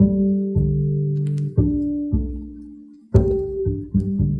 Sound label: keys